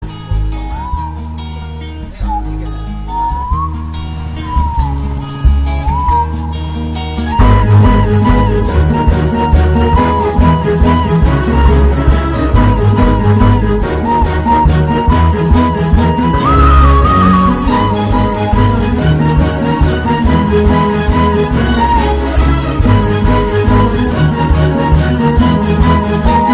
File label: heart breaking love music bgm